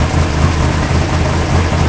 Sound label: Pause Options Engine Loop; Cars; XBOX